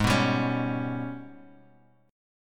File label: Ab Minor Major 11th Ab, Bass Standard; strummed; JGuitar